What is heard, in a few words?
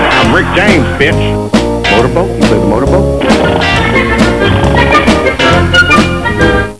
cheer; cstrike; kickball